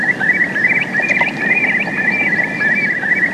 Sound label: CURLEW, ESTUARY; Zero-G Ambient 1